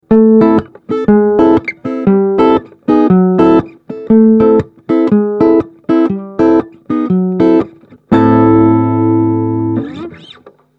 Behringer ACX450 clean

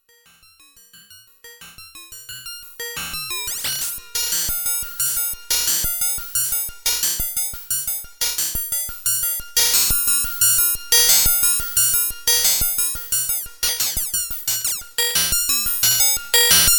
syst100 long fx

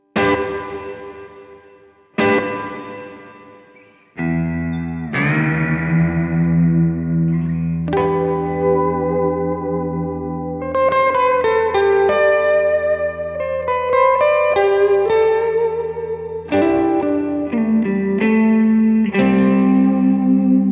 Church Reverb; Harley Benton Dual Effect Duality

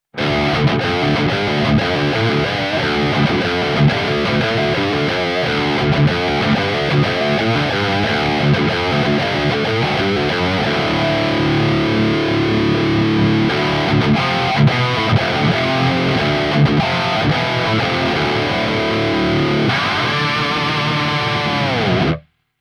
Enough Gain Rhythm; Gojira Plug-In Suite

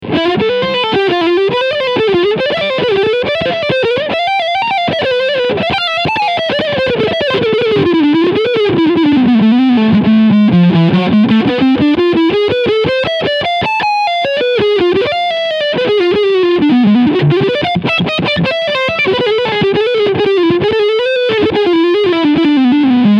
Lead Sound Front Pickup; Ibanez PGMM11

